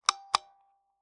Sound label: Taxi Meter